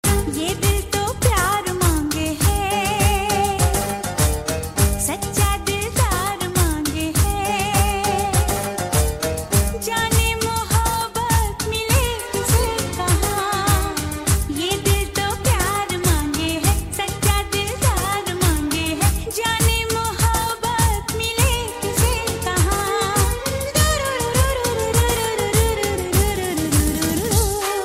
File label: Old Ringtone